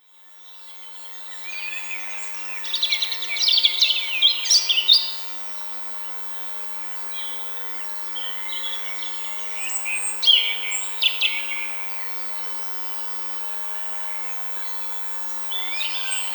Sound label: English Forest, Birdsong Dusk; John Leonard